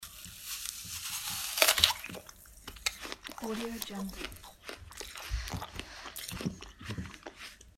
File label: apple chewing